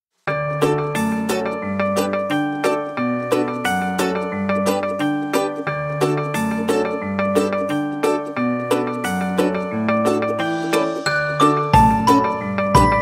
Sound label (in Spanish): Musica de Fondo Feliz Para Publicidad y Videos Alegres